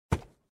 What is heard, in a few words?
wood